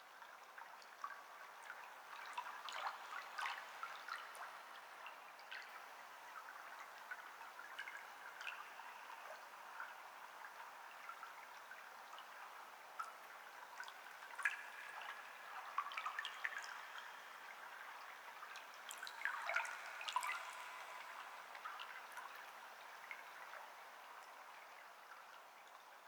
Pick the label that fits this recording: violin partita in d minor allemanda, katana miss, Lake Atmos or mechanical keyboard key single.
Lake Atmos